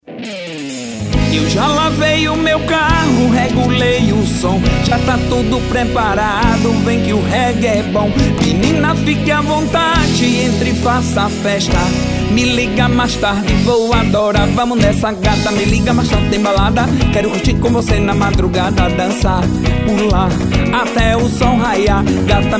tambor balada

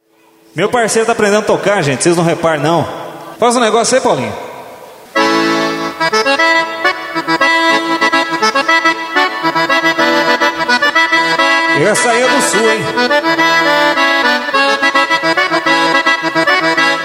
instrumental acordeon

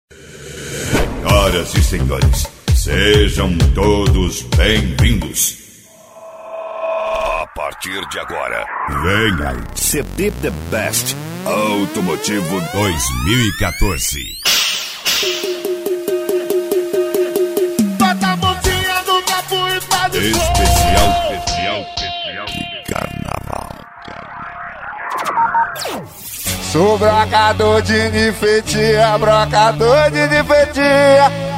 automotivo; the best automotivo especial de carnal

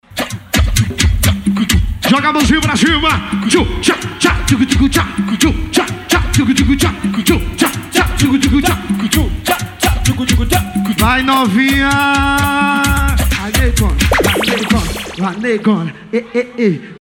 samba swing

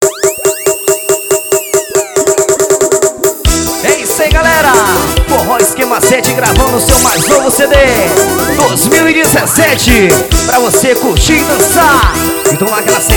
abertura

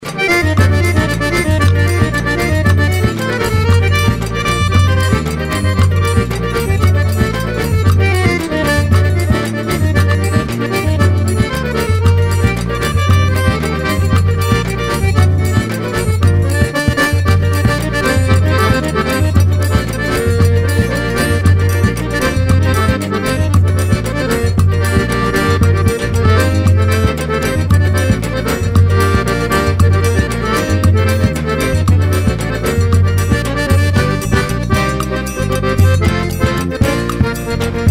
acordeom

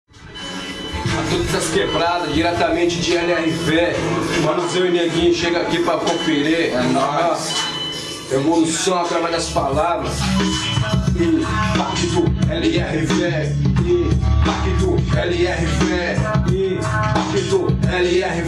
intro ao vivo ensaio; documentario

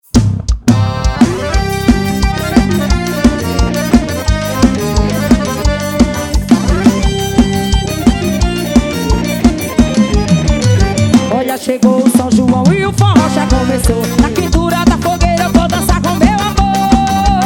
forró

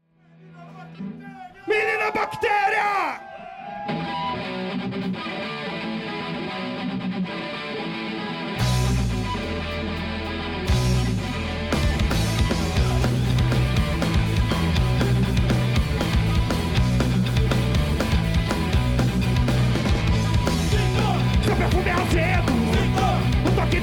ao vivo no st paul s pub

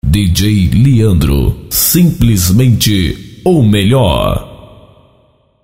vinheta

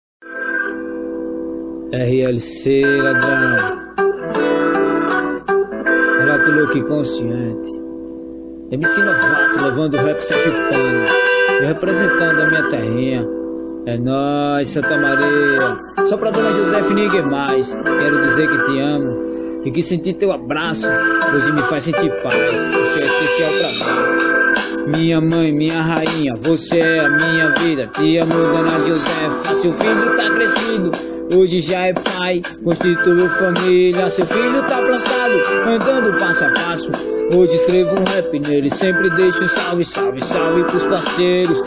rap sergipano

